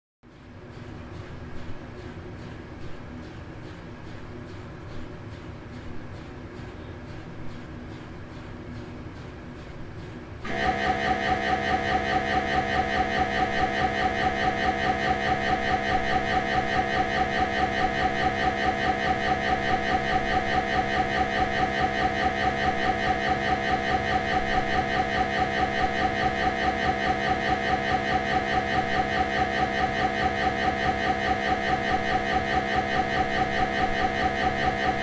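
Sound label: MRI tomography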